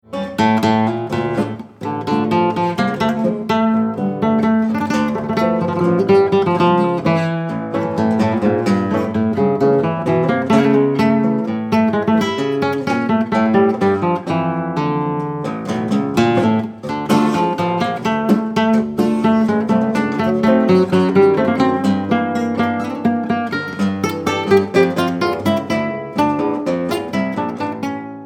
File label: Guitar; El Choclo